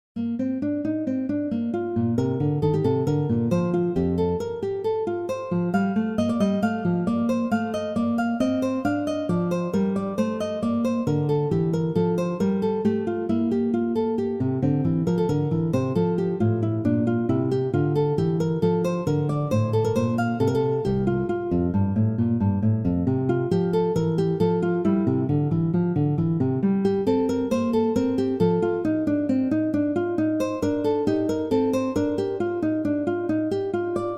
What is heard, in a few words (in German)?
Gitarren Solo